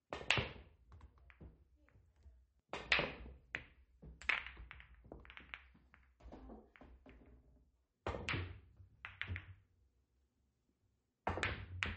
barrens; shockwave; fixitupkatesadventure

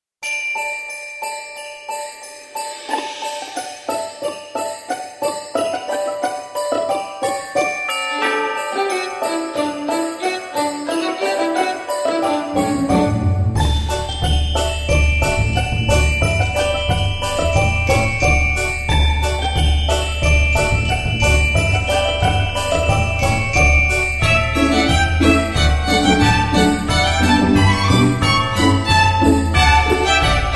christmas, music, winter; Flashpoint Ultimate 13; pandandago

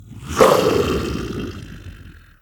werewolf Growl; halloween

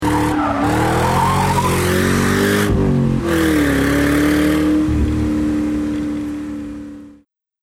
mustang turn; fordmustang

